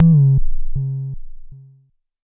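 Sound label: kick, Jomox MBase 01, drum machine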